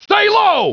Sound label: live fire